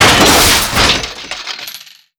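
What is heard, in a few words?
destroy pinball impact; Explosions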